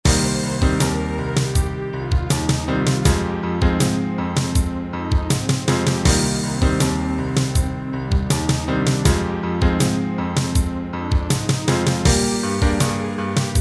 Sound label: so hard drums